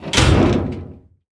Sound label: metal door close; Devour